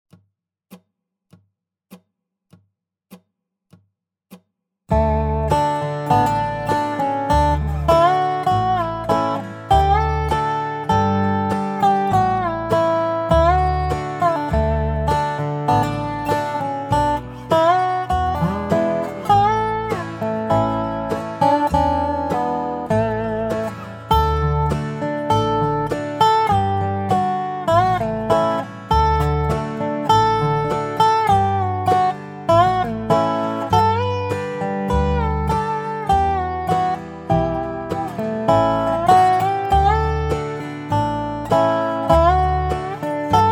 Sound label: int adv Dobro with backing track; Hark the Herald Angels Sing; Holiday 2025 Dobro Zoom Workshops